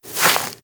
Grass Turn

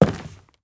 Soft Wood Walk

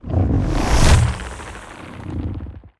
Puffer; moul

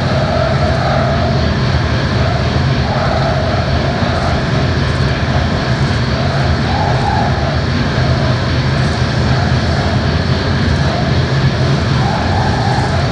Shafts Loop; moul

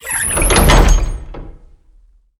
Slave Office Secret Door

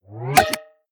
Lock Button; moul

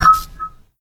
Light Off

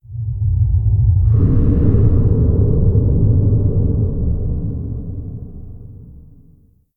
Fissure Stage

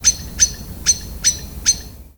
Rand Bird

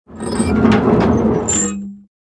Hut Valve Turn Left

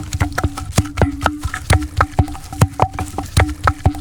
Log Dirt Roll

